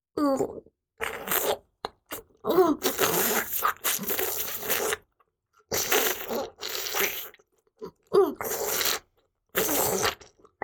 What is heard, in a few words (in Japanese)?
フェラチオ(中):頭を前後に振って陰茎をしゃぶる, チュパ音